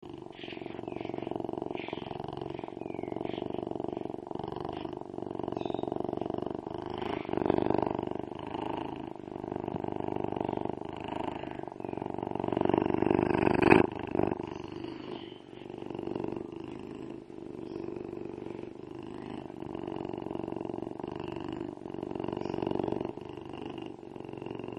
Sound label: Bobcat